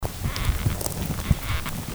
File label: Creaking Lobster; soniferous